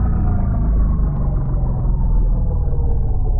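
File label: engine decel; decimator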